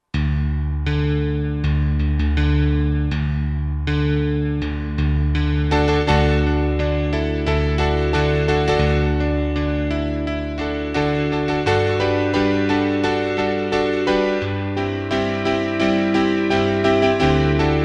E.Grand, Roland SR-JV80-03 Piano

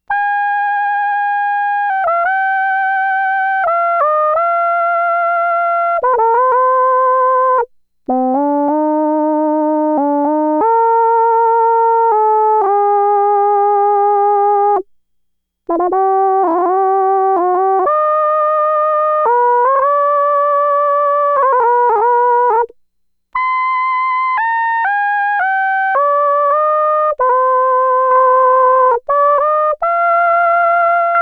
Singing Voice, Roland SH-2000

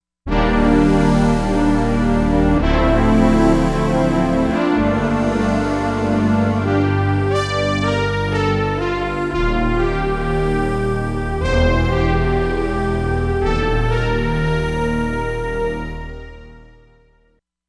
Misty Synth, Korg MSC-07S Synth; Korg MSC-07S Synth 2

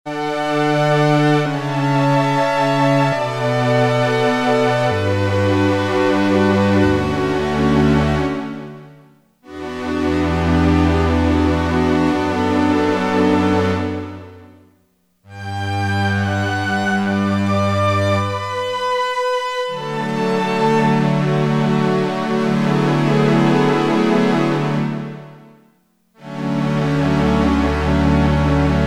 MID STRINGS, Roland Jupiter-8; Factory patches

